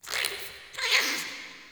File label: Interior Squish Gurgle, Infested Eris; Ambience; Misc Indoor; Warframe